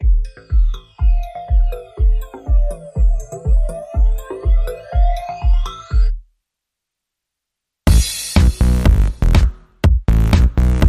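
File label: drum and bass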